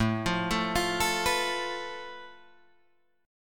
A Suspended 2nd Sharp 5th, Guitar, Drop D

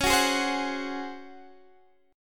Db Major 7th Suspended 4th Db

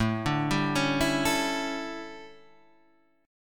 D Major 7th Suspended 2nd Suspended 4th, chord

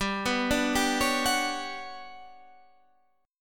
G Major Sharp 11th G, Guitar Standard; arpeggiated; JGuitar

